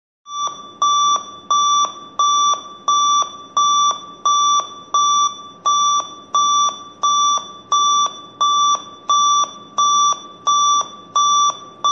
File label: Machinery Fault